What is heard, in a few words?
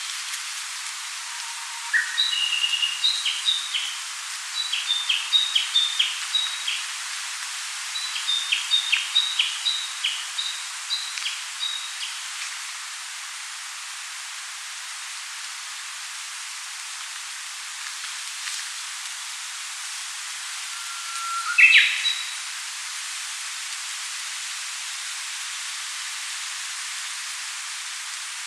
Cettia diphone